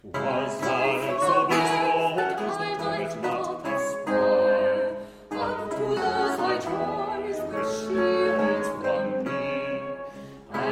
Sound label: Viola DA Gamba Vihuela DE Mano